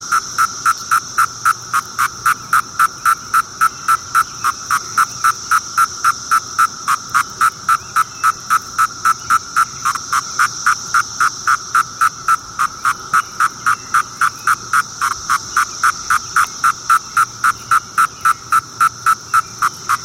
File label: M septendecim Court III call, cicadas